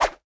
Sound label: minion sword; smite; npc; roman archer